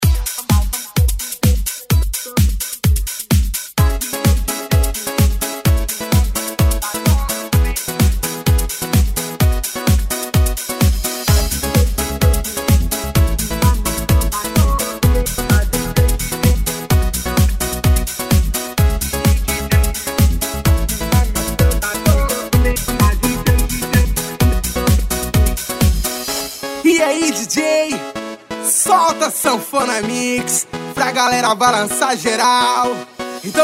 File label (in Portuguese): sanfona mix eletro funk; super dance vol22